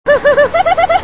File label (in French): vocale rire